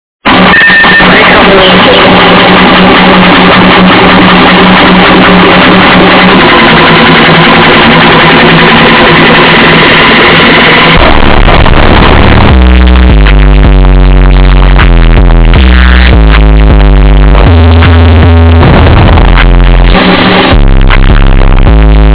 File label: relaxing music